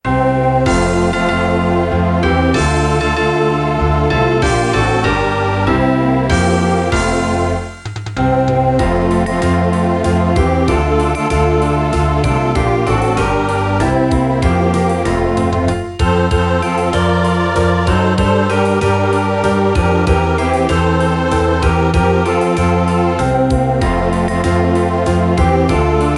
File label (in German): o tannenbaum playback; schulmusik